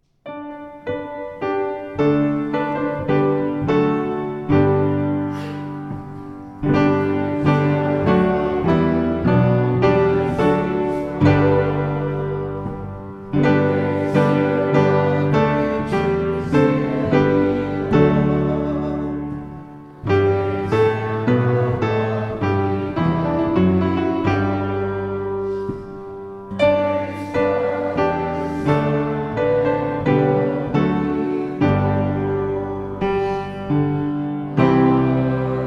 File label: piano and bass; Doxology